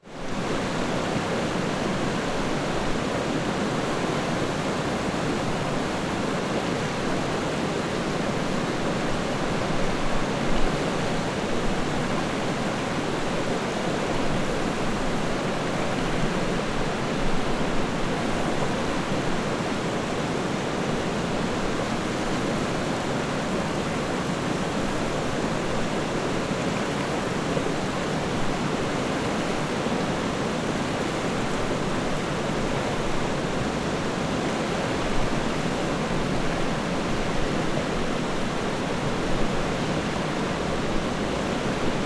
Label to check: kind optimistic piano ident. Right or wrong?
Wrong